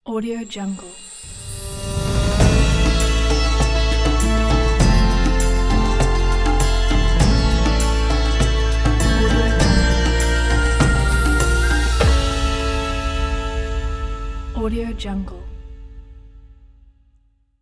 ramadan logo

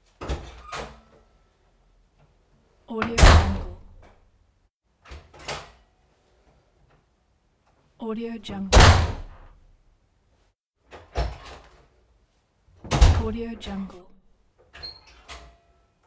door opens and closes

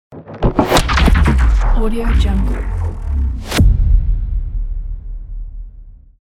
transforming logo

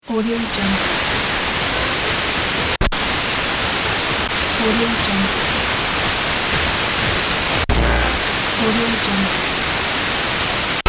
radio tuning

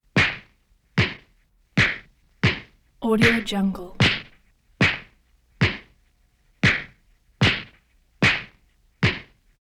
chin socks classic face hits punches; prosoundfx

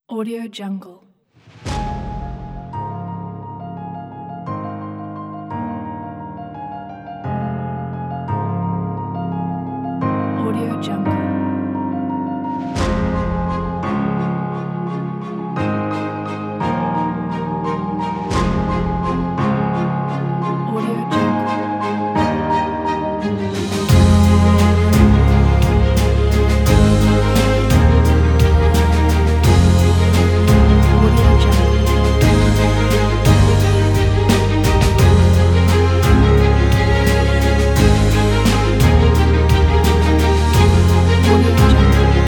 inspiring emotional cinematic trailer

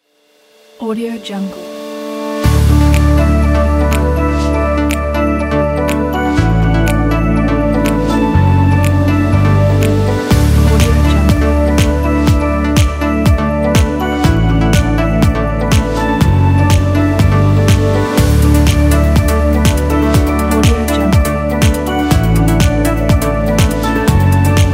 ambient music

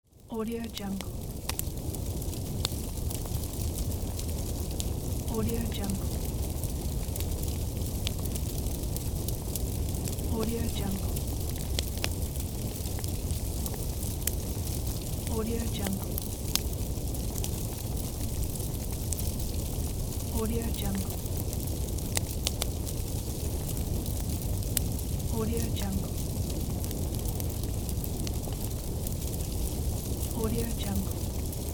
fire

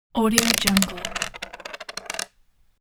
rolling dice

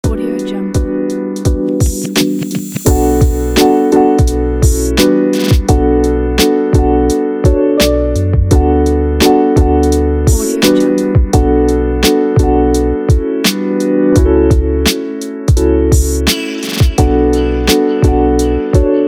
hiphop youtube blog

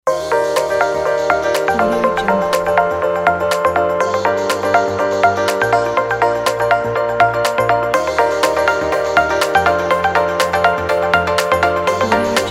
summer inspiring pop; selectedsounds

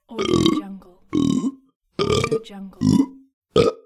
burp and belch pack

